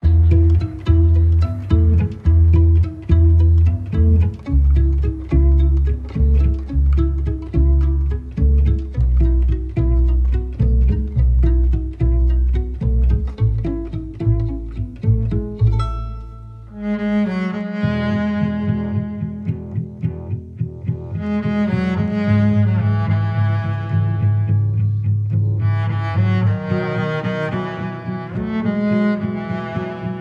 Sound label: cello bass violin cover